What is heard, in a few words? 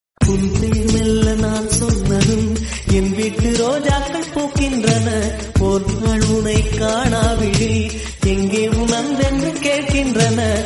tamil love bgm ringtone